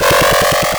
loop

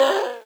screams human

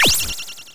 laser, weapons

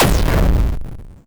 medium, explosions

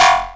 error

